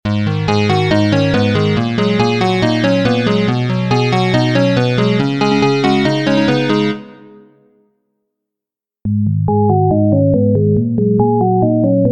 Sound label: Square Synth; inconsistency